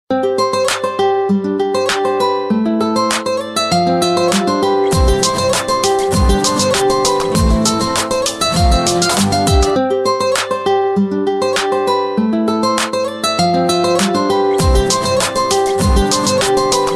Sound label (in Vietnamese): Nhạc chuông Xiaomi (Mặc Định); nguyên gốc; hiệu ứng nhạc chuông Xiaomi Mặc Định